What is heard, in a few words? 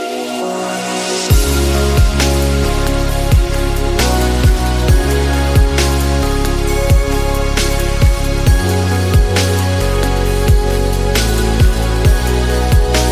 remix